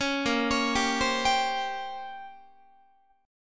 Bb Minor 6th Add 9th Bb down Guitar; Bbm6add9 arpeggiated

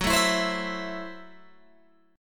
F sharp Minor 7th Flat 5th F sharp Guitar Open G

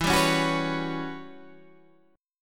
E Minor 6th Add 9th, Guitar, Open G; chord shape

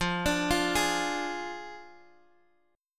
F Minor 6th, Guitar, Open D; 15,17,15,14,x,x; down; chord shape

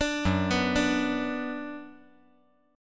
Gb Suspended 4th Sharp 5th, 5G,4C,5E,5A

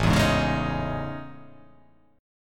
A Major Sharp 11th

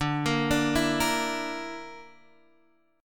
D Suspended 2nd Sharp 5th D Guitar Drop D

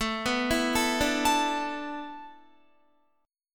A Suspended 2nd Suspended 4th, Guitar; Asus2sus4; arpeggiated

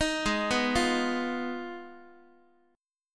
G sharp Minor 6th G sharp down Guitar; G#m6 arpeggiated; x,x,6,6,3,4; JGuitar

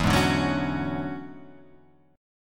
E 13th, Guitar, Half Step Down

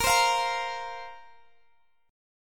Bb Suspended 2nd Bb Guitar Standard; Bbsus2 strummed; x,x,20,17,18,18; JGuitar

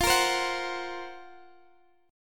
F sharp Major 7th Flat 5th F

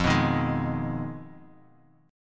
F Minor 7th Flat 5th Guitar Open D; 3,2,1,2,x,x; down; chord shape

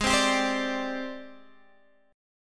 G sharp Major Sharp 11th; strummed; x,11,10,12,13,10; G#M#11

